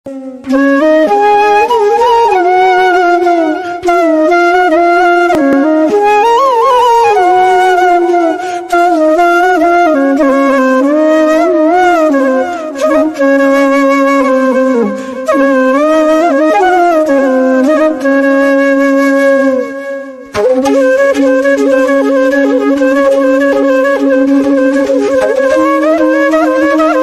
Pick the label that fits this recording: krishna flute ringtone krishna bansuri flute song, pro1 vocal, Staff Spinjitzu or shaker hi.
krishna flute ringtone krishna bansuri flute song